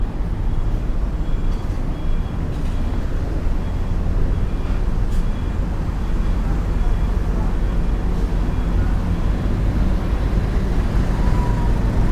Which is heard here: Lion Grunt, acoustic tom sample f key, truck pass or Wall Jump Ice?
truck pass